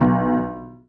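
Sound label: piano b octave; You Are Empty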